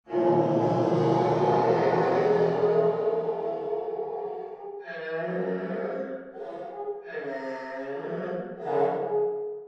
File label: horse neigh; ambience; dfcaverns